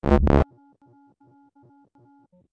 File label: Angry